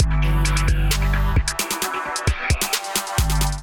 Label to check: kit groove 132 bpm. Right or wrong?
Right